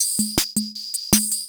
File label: CR78